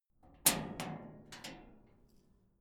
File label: metal gate latch